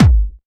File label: BD Punchy; Vengeance Samples; Bassdrums